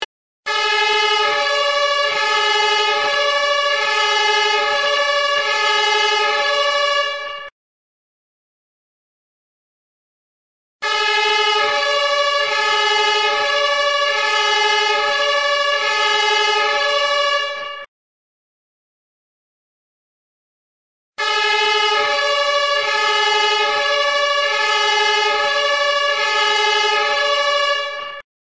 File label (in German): Feuerwehr Martinshorn